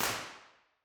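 council chamber impulse response, York Guildhall council chamber; b-format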